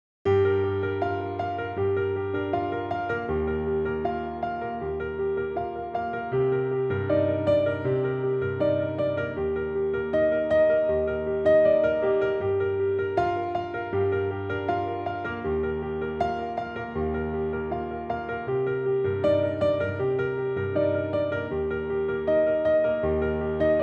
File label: piano Gm